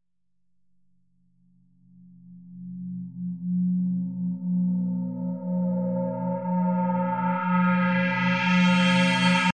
145 backwards ring gong; remixable collections; wobble techno; alyce wunderland

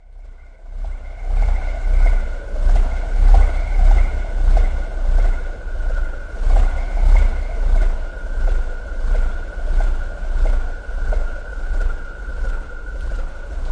spirit chaser wings, Atmospherics

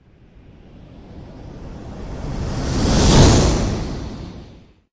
noisy flyby; Various Strange Sounds and Effects

